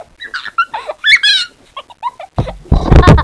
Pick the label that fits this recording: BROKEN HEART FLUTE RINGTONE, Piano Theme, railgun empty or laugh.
laugh